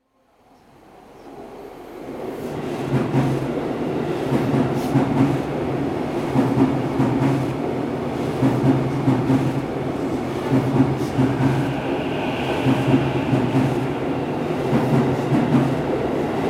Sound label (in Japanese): densya